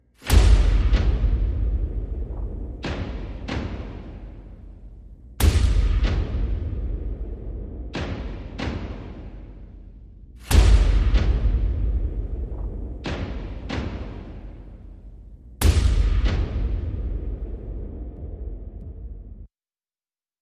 Percussion Only

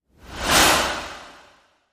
Breathless Breath Flyby

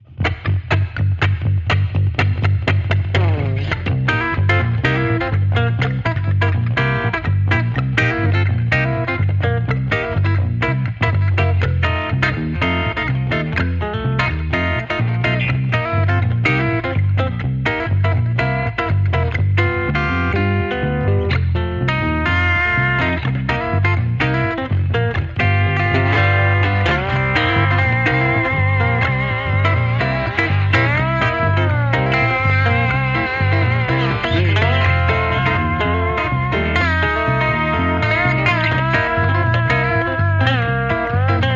Electric Redneck, No Drums

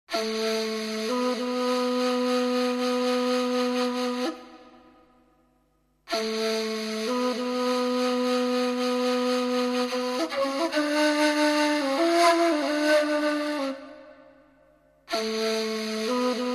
Persian Ney

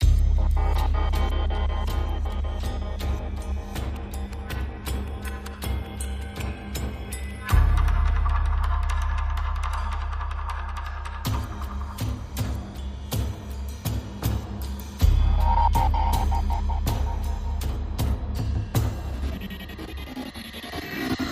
Perc and Pulses

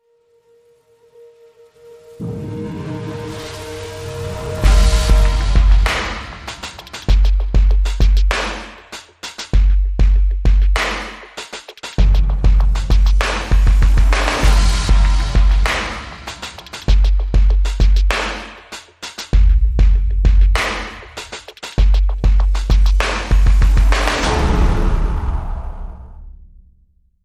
Drums and SFX

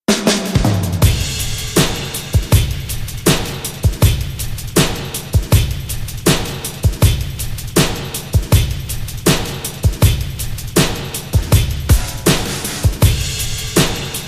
Beat

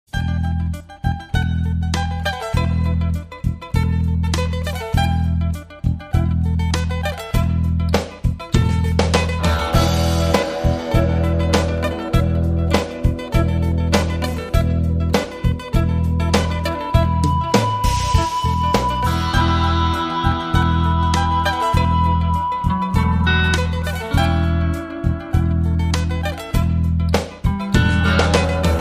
Strat Ballad

